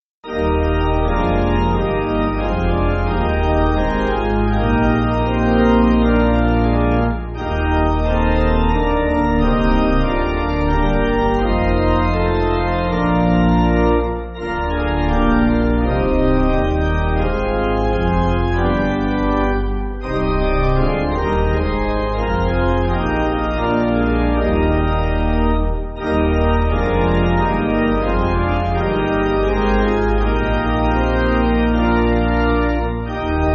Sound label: Pipe, Pipe Organ, Music Backing